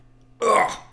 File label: argh